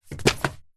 household mail bills greetings cards pile land floor post; zapsplat